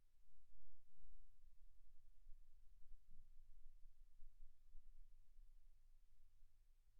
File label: silence 48kHz; single mic